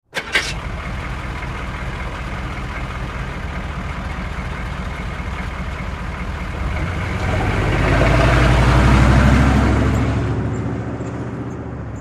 Humvee: Medium Start, Idle And Away.

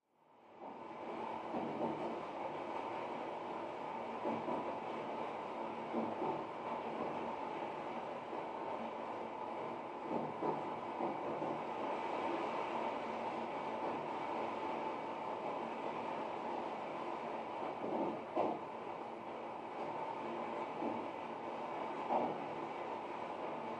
Rain; Hard Against Shutter Windows, From Inside.

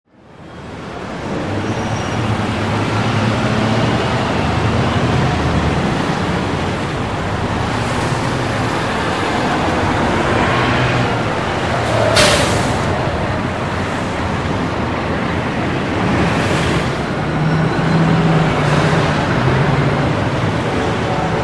Heavy City Traffic; big city

